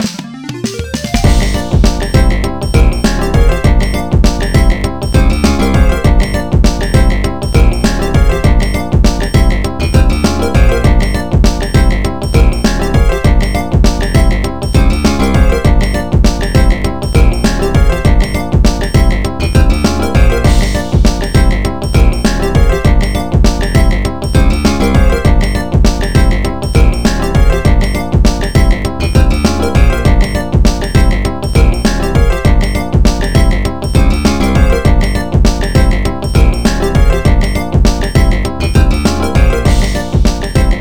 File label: Level Win; Midnight Play Pack